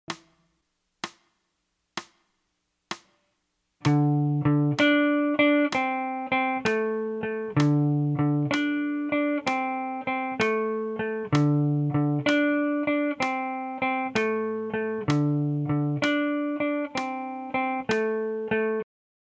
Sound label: 12 bar blues